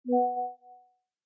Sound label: whatsapp windows notification